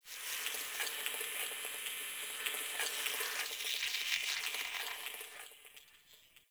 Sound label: Data Texture H; Warframe; Venus Open World; Venus Solaris United